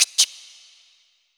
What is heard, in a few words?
FUNK, VX; USB Soundscan vol.47; AKAI